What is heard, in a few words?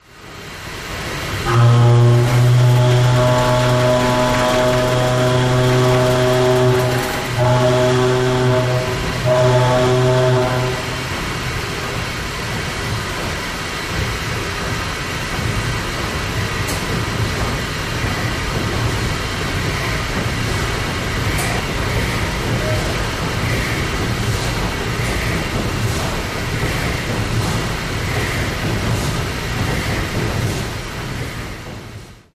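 steam engine whistle, WWII Liberty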